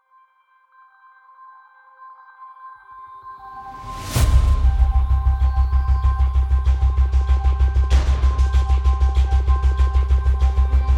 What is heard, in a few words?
Pulse Bass; tremolo